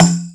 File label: Percussion